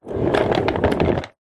industrial dolly trolley wood small on wheels short roll on wood; zapsplat